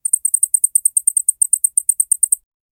small rat making noises; game effects

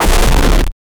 dark distortion slam

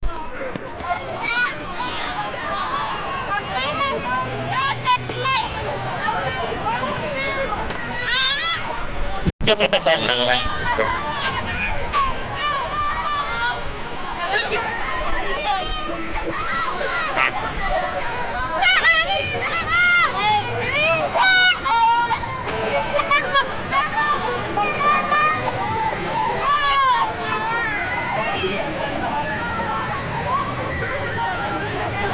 summer camp outdoor backg

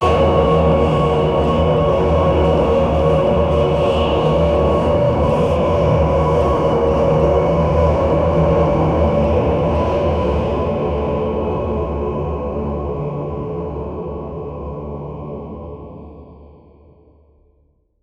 scary villain heavy, taiko drums

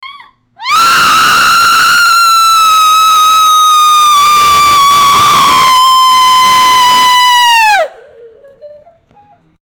elderly screaming when tr, fall

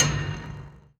heavy stone panel pressed

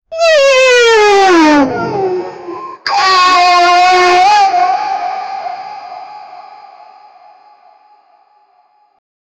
create a sound of a, slowed down

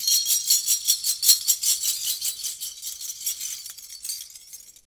sound of mahjong pieces, mahjong

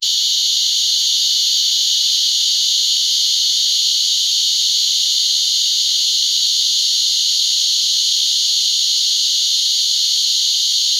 white noise summer deep, forest

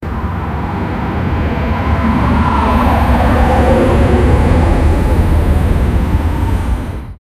subway passing by; departure

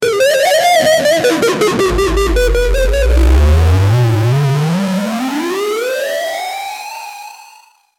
thick hardcover book bein, interaction

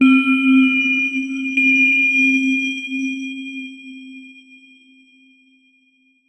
magical

